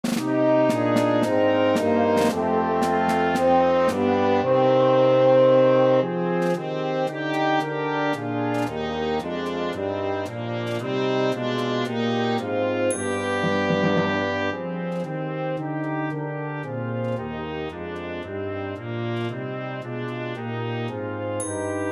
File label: Trumpet Track with Melody; God Rest Ye